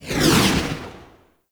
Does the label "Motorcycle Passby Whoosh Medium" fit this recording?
Yes